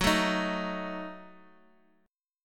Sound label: Gb Minor 6th